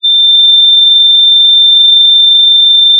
pop, sound of a tea kettle whistling

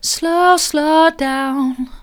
slow slow down soft, vocal

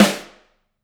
room Rim, drum

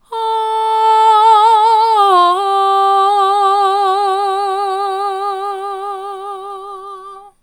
ahh mid, vocal

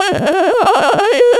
surprise strong; VIVAE

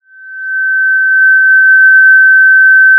Sound of a police car siren

